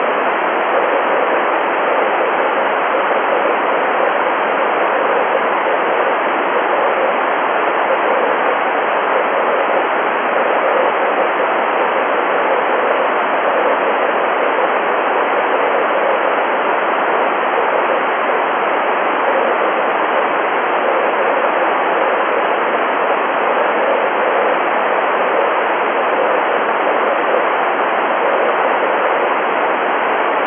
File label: 3cm CW 500hz; End of the QSO, 500 Hz pitch; GB2FRA; 10ghz